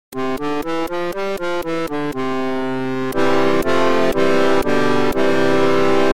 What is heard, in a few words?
Flutina Accordion; Harmodion; Syntheway